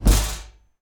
Metal Bash

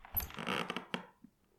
Wood Creak Single

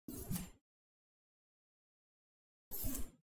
Samurai Sword Slash

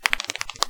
Knuckles Crack

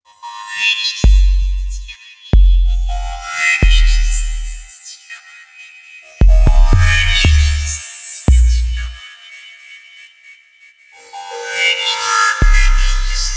Industrial Synth

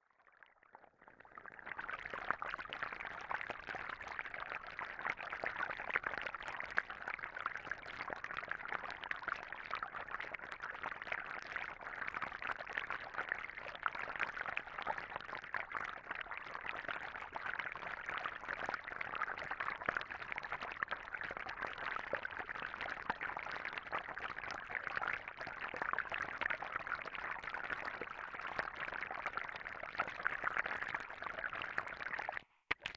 Underwater

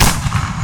Fireworks Mortar

